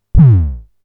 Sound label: bd crunch, Polivoks